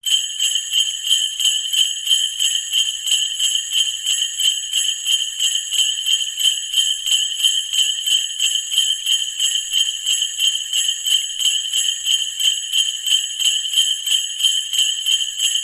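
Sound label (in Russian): zvuk sanej santa klausa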